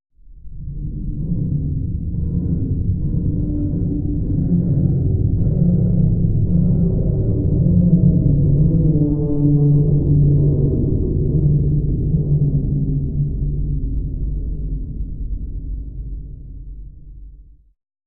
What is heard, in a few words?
Underwater Int Metal, metal groan atmospheres; Underwater Int Metal Rumble